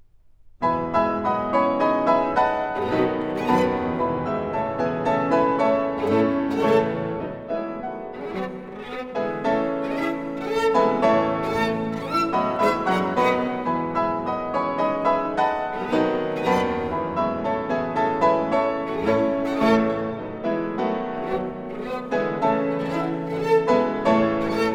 Trio for Piano, Violin and Cello in A minor Allegro moderato; Var． VII．; Deutsche Grammophon